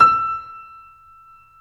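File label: STEIN E5, Steinway grand; Greytsounds VOL-1; STEINWAY GRD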